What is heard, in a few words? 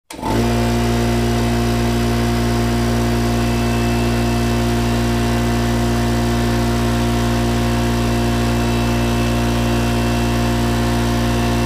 Air Compressor 2; On, Steady Chugging Motor, Off